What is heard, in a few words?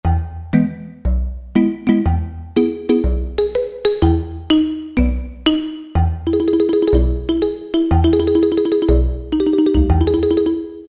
Bass Marimba, Roland D-50; Factory preset demos